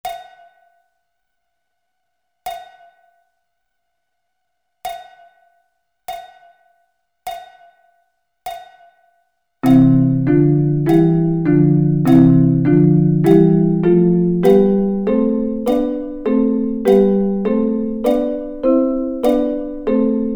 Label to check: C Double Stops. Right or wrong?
Right